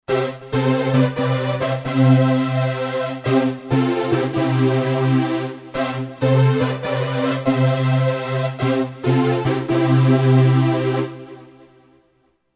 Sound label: Anthem Stab SYNTH